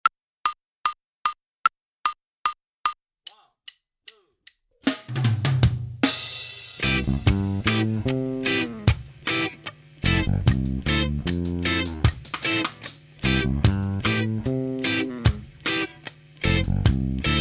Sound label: Minus Keyboard; Inst Ensemble